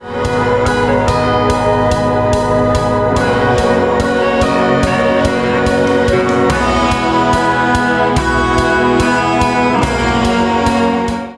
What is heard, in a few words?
Church Melody; church melodies volume 1